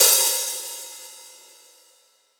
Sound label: Acousti Hat Hit, hihat; ultimate hihat; Hits; AcoustiHat B